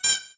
squeak duck; World of Tanks Blitz; ambient milbase

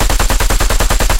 loop dt origin; guns tanks

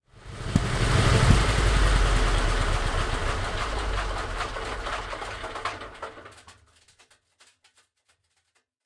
sherman m4a1 engine stop; War Thunder

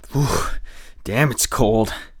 bleed in; Escape from Tarkov

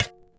Staff Dirt; Civ4 warlords

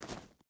footstep hide gravel walk; Rust

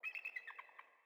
sting eagle; Rust

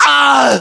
death; ModernWarfare; localized english

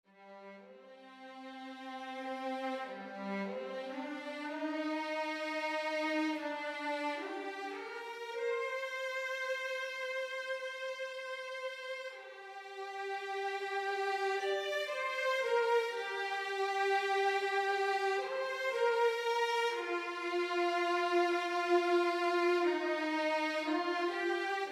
music interactive; World of Tanks

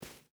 footstep barefoot sand run; Rust

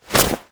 pickup item; Rust

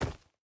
footstep burlap forest jump land; Rust

